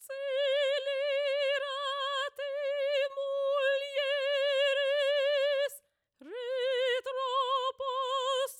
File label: choir